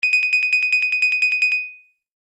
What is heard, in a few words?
Ring Synth